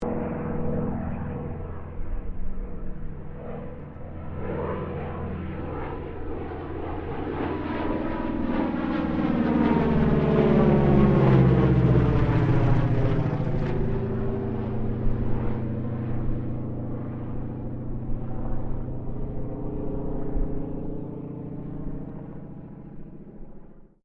Aircraft CAC CA-25 Winjeel pass, WW2 era Australian training aircraft; Airplanes